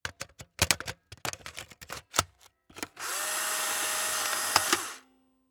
polaroid camera film cartridge load; mechanical

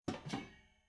pot lid lift, kitchen

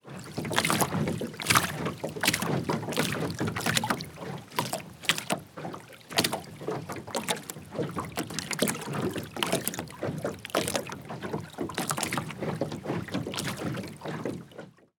water lapping against boat; transportation

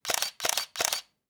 camera shutter click

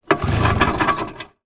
boat outboard motor pull cord; transportation

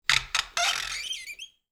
twist squeeze

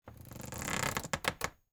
wooden floor creaking; nature

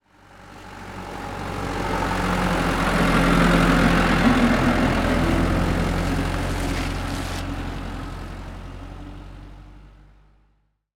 van pass by slow; transportation

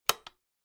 switch

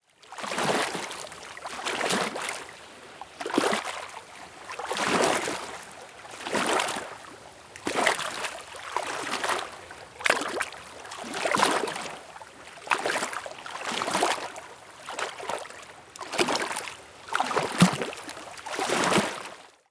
lake waves lapping on the shore; nature

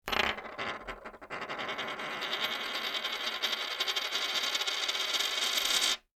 spinning coin

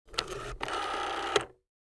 rotary phone

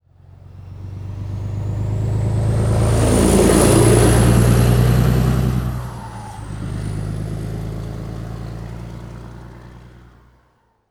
truck speeding up; transportation

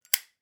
zippo close; nature

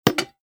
button

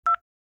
cell phone

